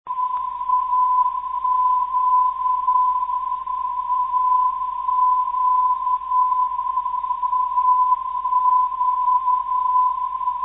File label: aq 404khz, NDB